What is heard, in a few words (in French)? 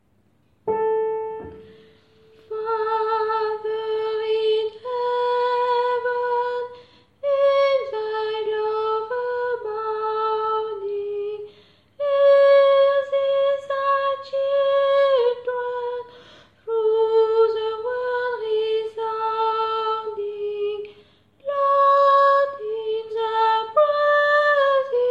soprane 1 voix